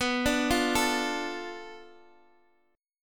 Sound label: B Minor 7th Flat 5th B Guitar Open D